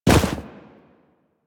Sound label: Run Deep Snow, Footsteps; Warframe; Operator